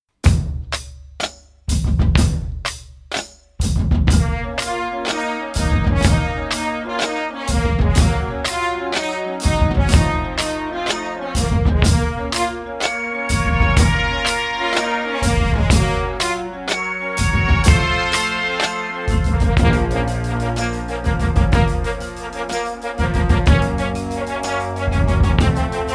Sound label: Marching Band